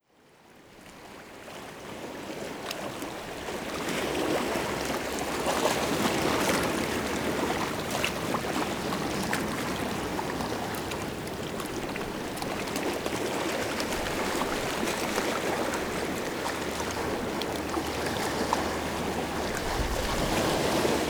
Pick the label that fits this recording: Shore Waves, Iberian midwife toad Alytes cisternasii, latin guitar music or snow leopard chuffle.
Shore Waves